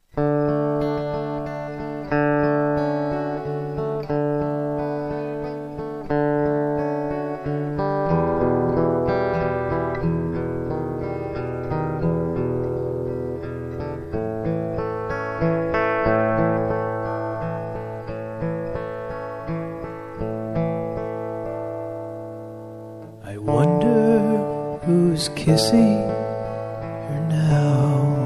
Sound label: kiss